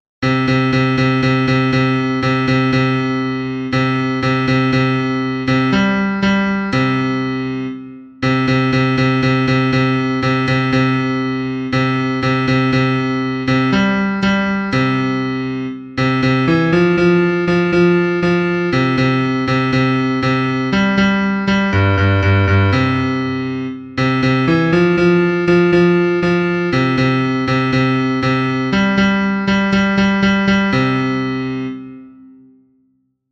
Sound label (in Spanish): bajo, himnos en piano; 40 DEMOS GRACIAS AL SENOR; cantos del camino